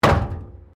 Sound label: METAL BIN IMPACT PLASTIC CRASH BARRIER